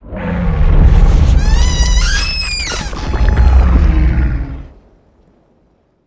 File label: shit squeeze